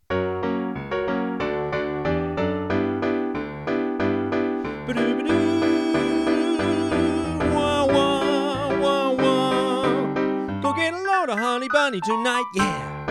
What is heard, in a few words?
Tenor